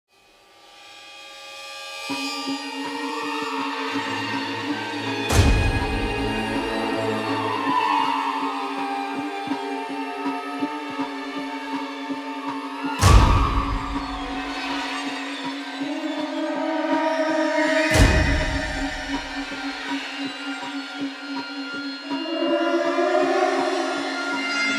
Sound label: Suspense 81BPM, Classic Horror Sci-Fi Music; Dead Ark